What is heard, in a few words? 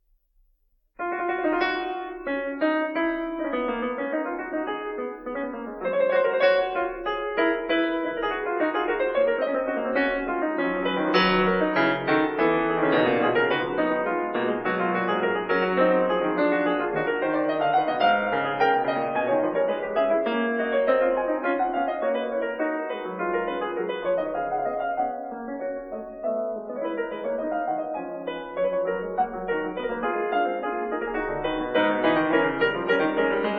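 F-sharp minor Fugue, piano; BACH; WTC; BOOK II; Feinberg, Samuil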